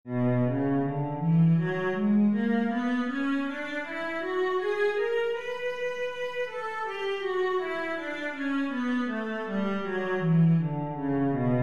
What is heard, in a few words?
b minor, cello